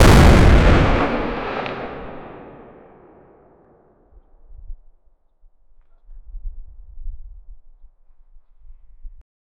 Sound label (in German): knall einer kanone boom